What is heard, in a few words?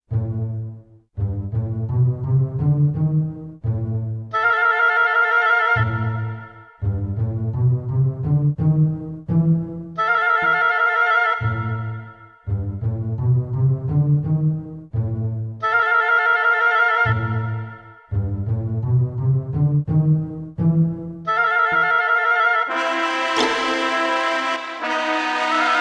Dungeon; Growlanser III